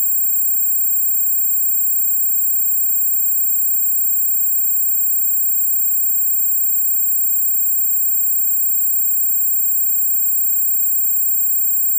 tinnitus; Escape from Tarkov

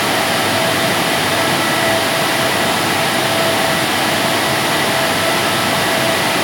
UH1H Around Engine; DCS

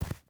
footstep rubber boots snow run; Rust

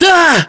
pain

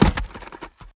objects, World of Tanks

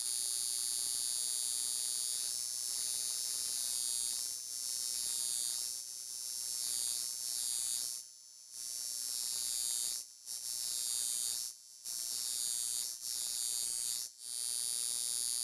ambience electric buzz fluctuating; Rust

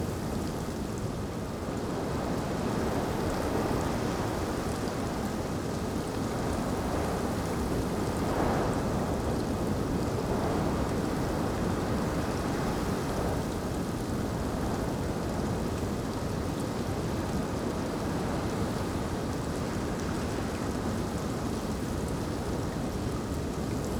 ambience beach day breeze drizzle; Rust

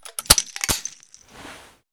Inf Weapons Foley; Red Orchestra Ostfront 41-45

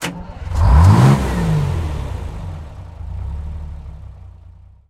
valentine engine start, tanks; cannons; War Thunder

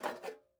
ui pickup metal sheet; Rust

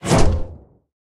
shell whiz

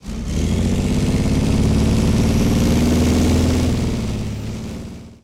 leo2a5 engine load, engines tanks; War Thunder

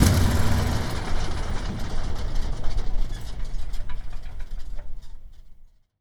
Aircrafts Engines DB605 Shutdown; DCS

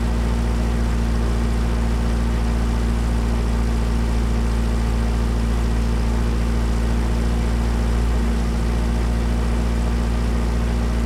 m4a3 engine rpm, engines tanks; War Thunder

